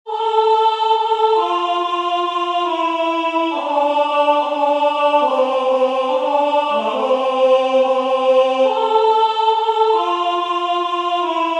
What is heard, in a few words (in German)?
Es ist ein Ros entsprungen Alto